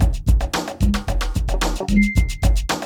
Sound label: BEAT, Beats 105-150bpm; PlatinumPhattCD1; E-MU Producer Series Vol. 8 – Platinum Phatt